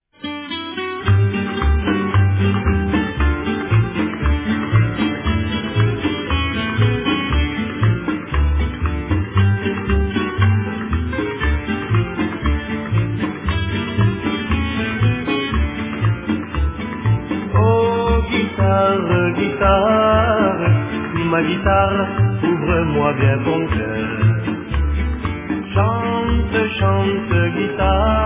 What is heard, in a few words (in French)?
guitare